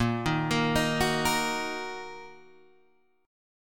Bb Suspended 4th Sharp 5th, Guitar, Half Step Down; Bbsus4#5 arpeggiated; JGuitar